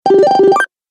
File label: Retro Videogame Fx Mini Bonus; Video Game Sound Effects